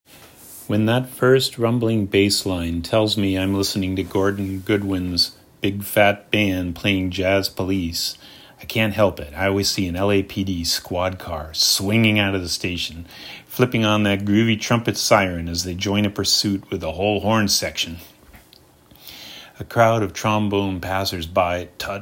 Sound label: Jazz; police